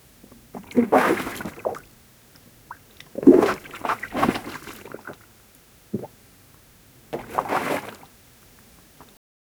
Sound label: young man vomit water bru